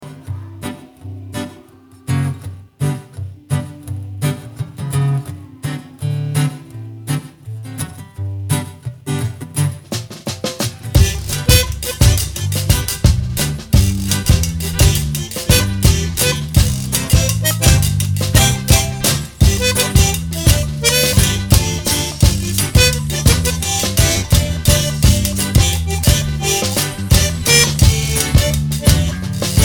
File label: Cajun Zydeco Band; Orlando